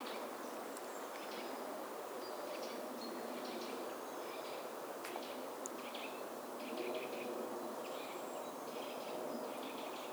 forest

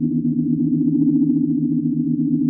voy transporter; trek

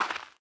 step

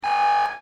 timer alarm

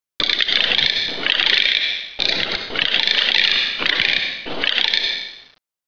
dead tree

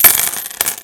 coin

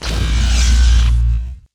em pulse grenade; trek

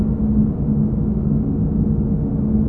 Turbo Lift Amb; trek

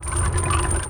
chain pull

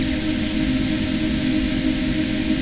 big computer

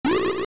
Magical Rod; zelda

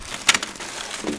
clunk

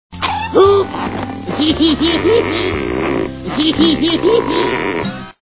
hehehehe

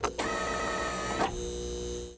proximity mine setup; trek

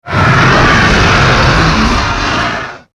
dragon roar

